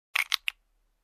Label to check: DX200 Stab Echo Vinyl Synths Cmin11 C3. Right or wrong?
Wrong